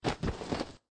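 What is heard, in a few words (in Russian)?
восточная и западная одежда одежды падают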